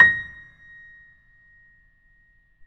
BOSENDORF

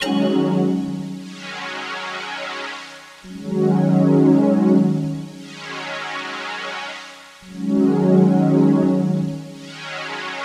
Pad, Melodic Synth

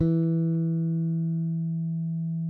SPEC SUSTAIN, Bass

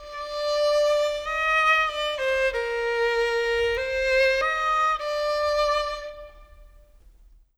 Violin; Sonic Foundry (Sony Creative Software) - Orchestral VOL-2; Modern 36

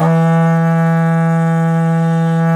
E2 HORN, Didgeridoo; Big Fish Audio; DIDGEHORN1

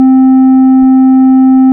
ORG Farfisa Flts; Flt16'; C 6; Keyboards of The 60's and 70's

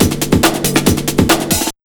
Big Beat Drumloops, SPEED 140; USB Soundscan vol.25; AKAI

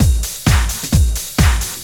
drum 130 techno; Techno Trance Essentials

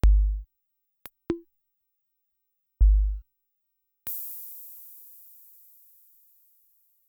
Snap; Sonic-Potions LXR

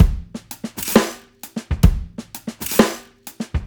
TRIPLET, CLEAN KIT; Tuna Beats

